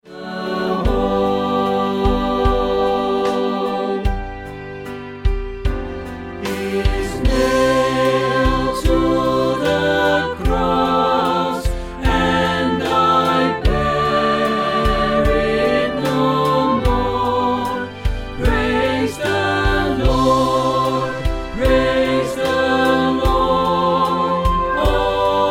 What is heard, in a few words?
Gospel Choir; It Is Well With My Soul